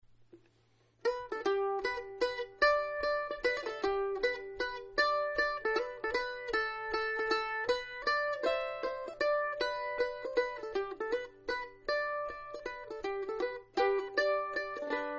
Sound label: tombigbee waltz, bush banjo